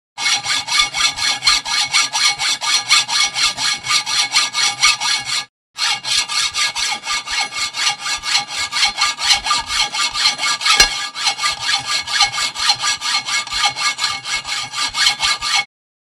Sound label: Hacksaw on Metal Hack